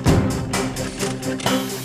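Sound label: mountain world music cage forcefield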